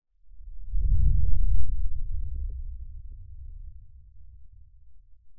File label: Whoosh Rumble; bottlerocket